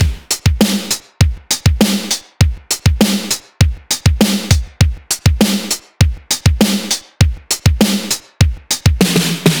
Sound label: 100 Beat Simple Digital; Zero-G; Future Wave